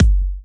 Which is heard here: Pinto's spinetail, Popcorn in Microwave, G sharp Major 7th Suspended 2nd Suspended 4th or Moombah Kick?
Moombah Kick